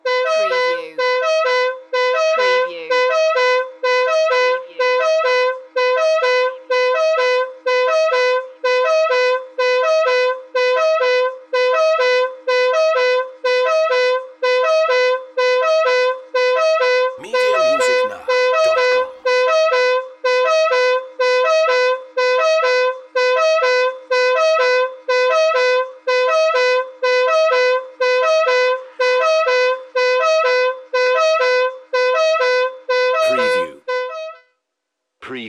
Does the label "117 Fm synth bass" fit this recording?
No